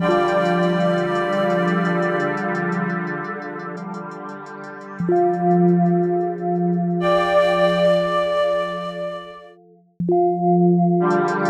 synth cuts (seed); Speck's

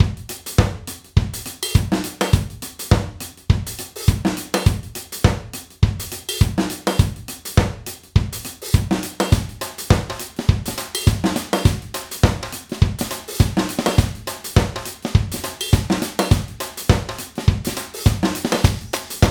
drum fusion 103

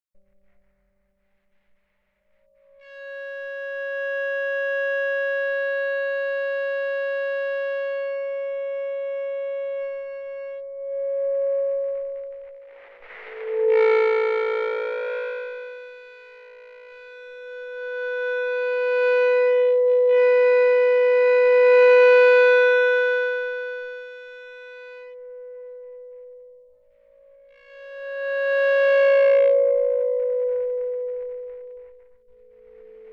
A Drone on Ebow

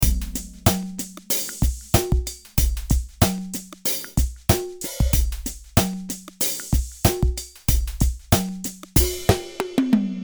beat

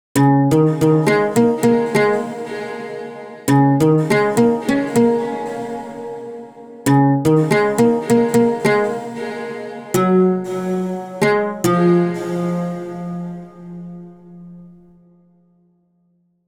Unknown Folk

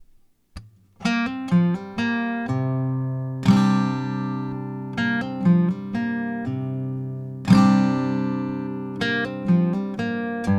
GTR

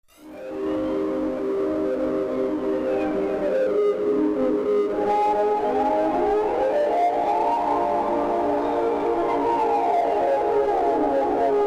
Drone Squelch

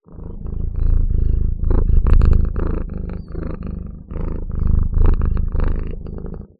Cat purr

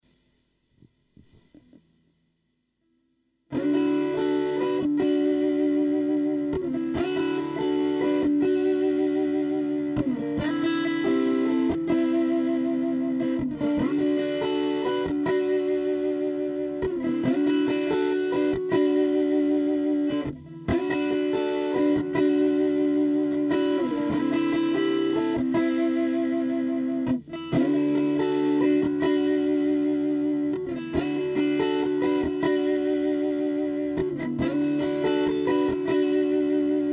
D140 GT Riff Trash

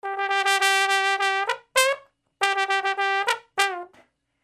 brass loop

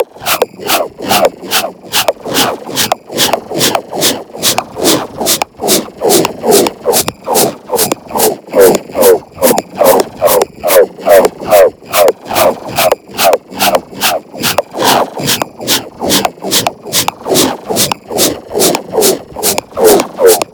Cm72 Zone Ambience

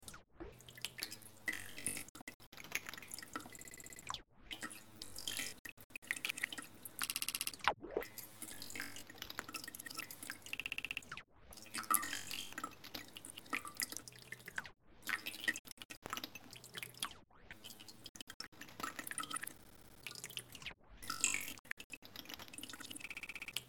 Glitchy Water

